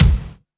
KICK ROOM; Back In Time Records; Big Bang

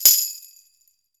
tambourine

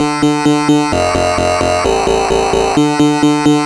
MiniBrute Loop, 130BPM

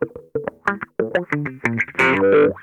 CRO MAGNON, Funk Guitar; Zero G - Funk Guitar

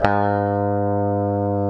GTR ELGTR, Clean E.Gtr, Velo Chord; Roland LCDP02 Guitar and Bass

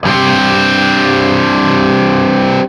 5TH C, Guitar; East West; Steve Stevens Guitar Samples Collection

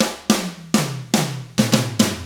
110 SMBA samba; ILIO; Double Platinum Drums 1

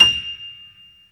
StereoGrand, Keyboards

